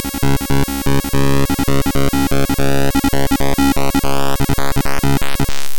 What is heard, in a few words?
rythym